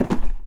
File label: cardboard soft, physics; Dystopia